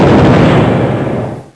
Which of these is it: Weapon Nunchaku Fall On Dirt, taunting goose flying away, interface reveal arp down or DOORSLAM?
DOORSLAM